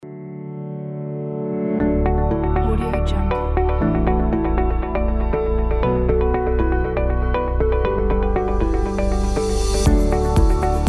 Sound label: upbeat and inspiring corporate